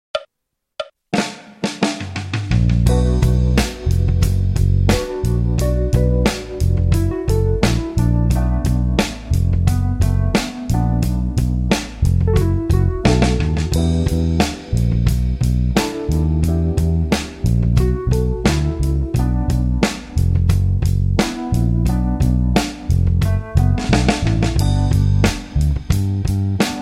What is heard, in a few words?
blues